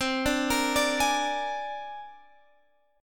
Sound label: C 7th Suspended 2nd Sharp 5th, C, down, Guitar, Open G